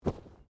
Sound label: Bodymovement Fall Light